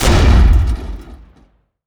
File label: Hit Med In, Damage; DCS